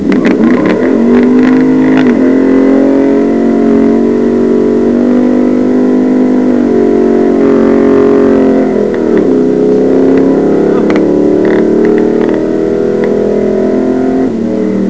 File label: burnout